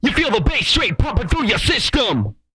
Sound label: BASS PUMP; R&B & Dance; HIP-HOP; ILIO; Vocal Planet